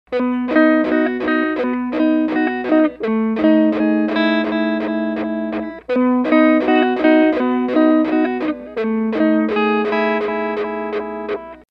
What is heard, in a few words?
Tap Triplet, toggle